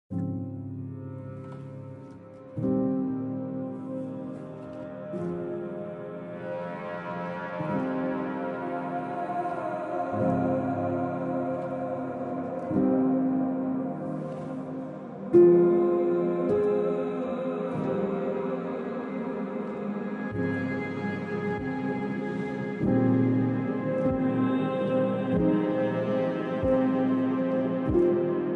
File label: chillout mix